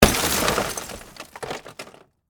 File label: Window Smash, Crash, Car, Window, Smash, Debris; Crashes; Objects; BlastWave